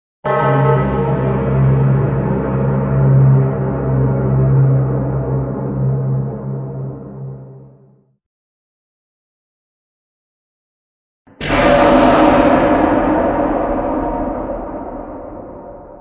suoni horror suoni paurosi jumpscare sound creepy sound